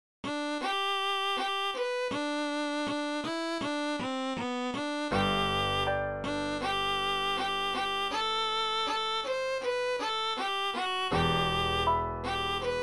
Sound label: piano and violin; How Firm a Foundation